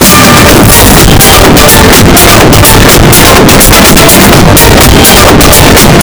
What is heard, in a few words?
bass boosted